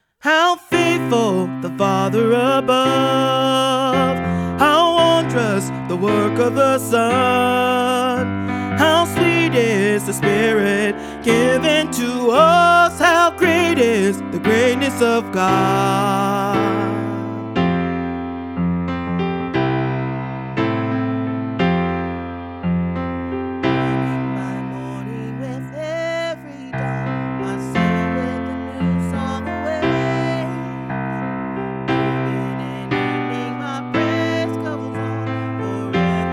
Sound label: Tenor w Lead, choir; christmas choir